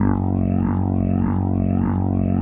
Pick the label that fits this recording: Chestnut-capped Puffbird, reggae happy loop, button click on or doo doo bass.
doo doo bass